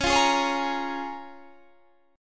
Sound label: Db 6th